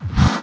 BD Gate FX, Bassdrums; Vengeance Samples

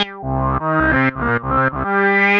Arp C Line, ARP Odyssey; musicradar-synth-samples

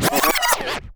back spin med, vinyl record

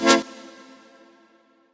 Synth Cuts; Vengeance Samples; VES1 Instruments

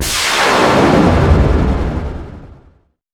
Sweeps Medium

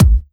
Bassdrum; Vengeance Samples; VEE Bassdrums

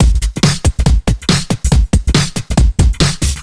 Breakbeat 140 BPM; Vengeance Packs; vengeance essential club sounds-3; VEC3 Loops 140 BPM; VEC3 Breakbeats 140 BPM

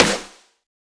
Snare; Ghosthack

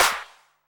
Clap; Vengeance Samples; VEC1 Claps

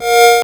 Arcade Riffs

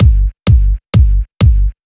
Kick, 128 BPM; Vengeance Samples; VTDS2 Song Kits; Vocoder Mother Earth

